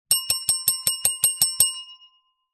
bell small reception desk bell multiple impatient rings